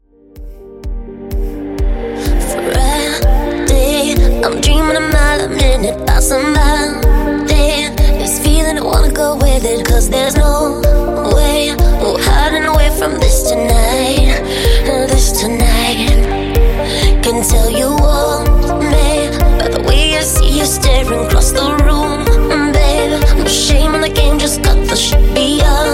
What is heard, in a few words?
Club Bootleg Edit 126Bpm Clean